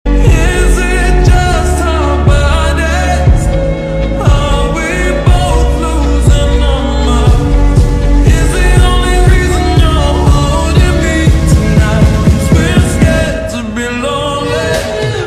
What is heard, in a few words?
lofi ringtone